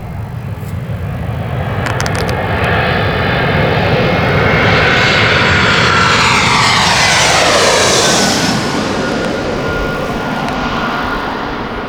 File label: air